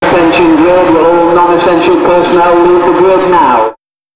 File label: race start; AOS RF1 Kart Demo